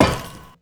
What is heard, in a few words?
ladder, footsteps